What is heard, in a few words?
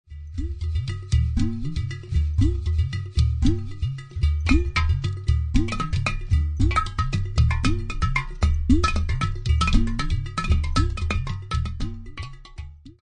udu